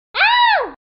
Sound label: scream scratch sound effect